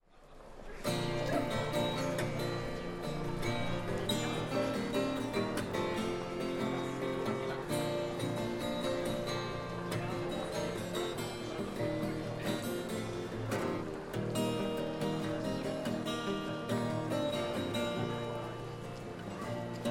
street musician; reite strasse

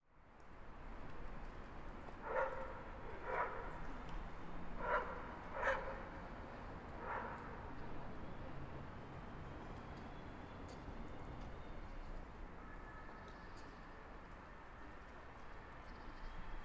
alter markt street sounds

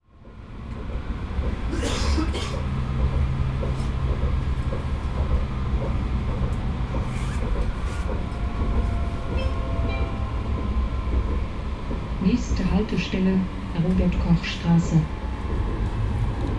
tram

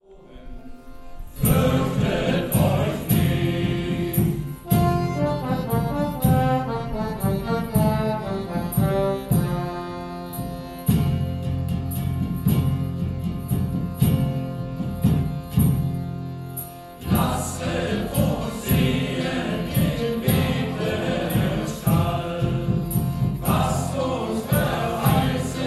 shanty choir stadthafen

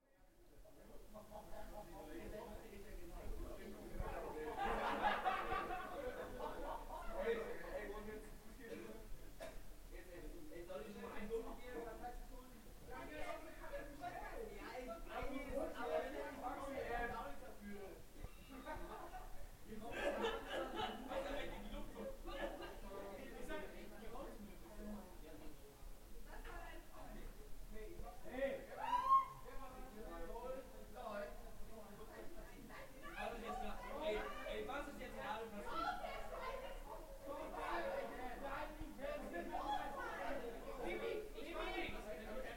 winter nightlife long

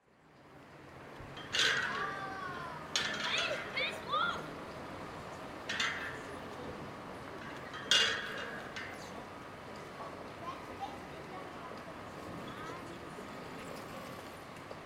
children playground

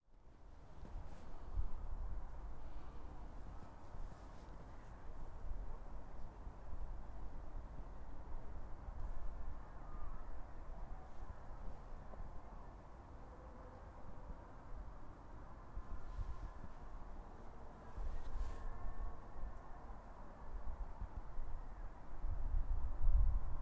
footpath opposite stadthafen; gehlsdorf

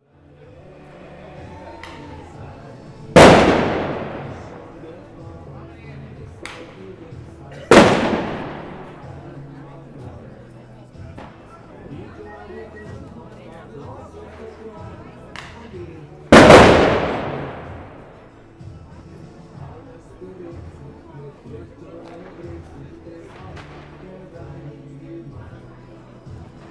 firebangers; oberanerplatz

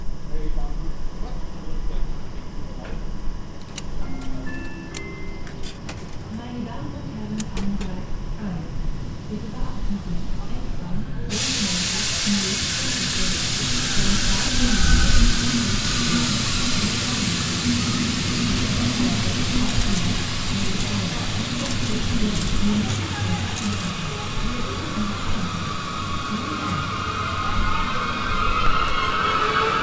hbf platform buying tickets

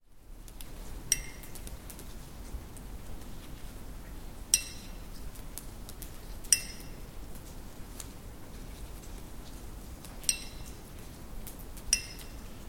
inside disused railway building

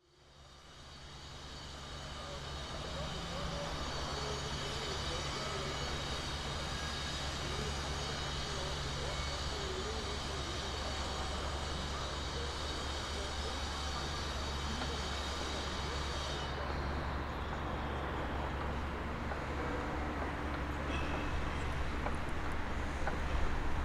habour industrial sounds

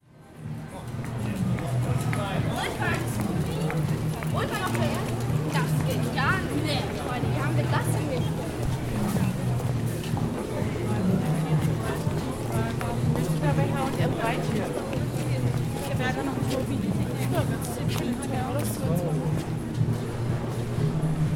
outside; kropeliner strasse

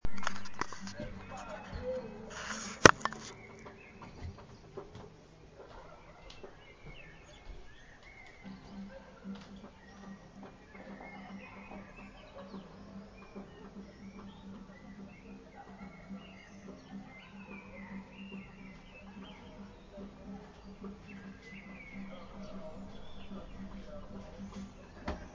musicmaking courtyard

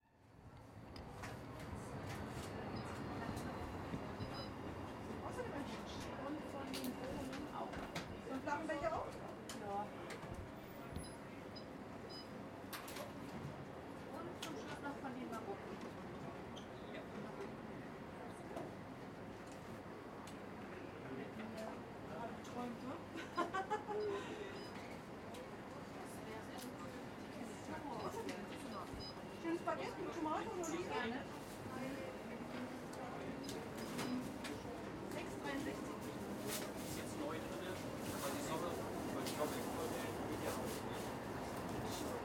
market; oberanerplatz

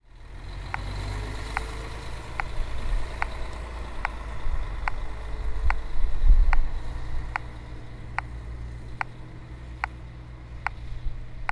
pedestrian crossing; saar-platz

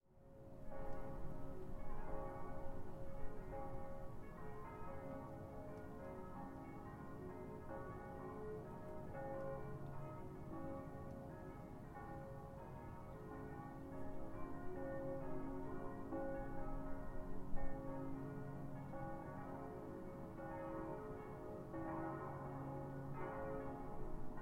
ferdinandstrasse church bells

